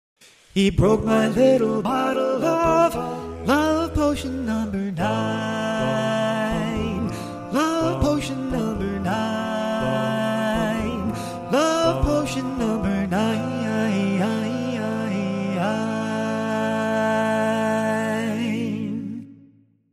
Lead, barbershop tags

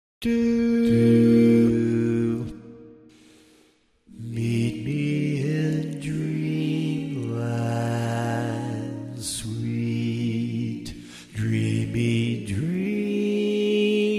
Bass, barbershop tags

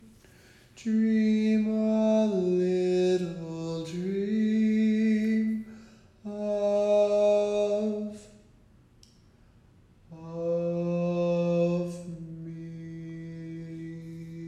Bari, barbershop tags

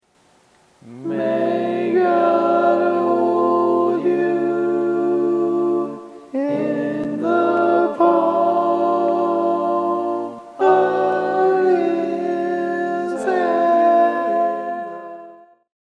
Tenor, barbershop

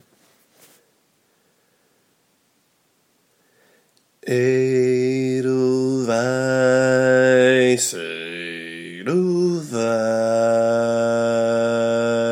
Bass, barbershop tags

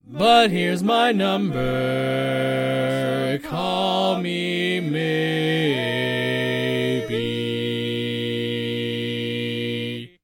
Bass, barbershop

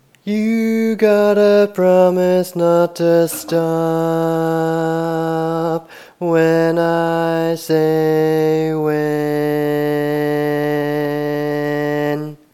bass

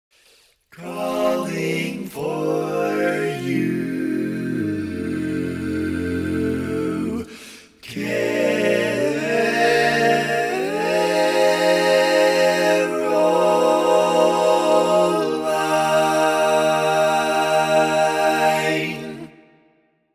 All Parts, barbershop tags

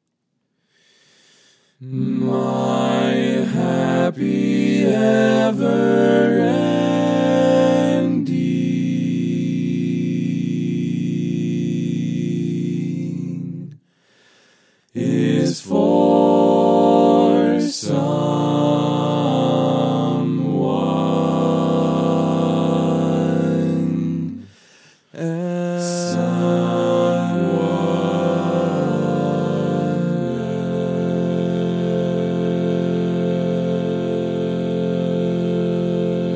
barbershop tags, all parts

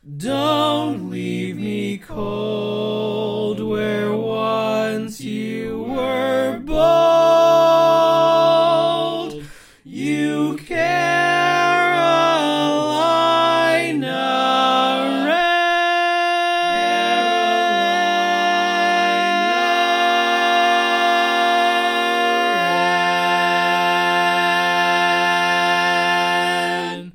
Lead, barbershop

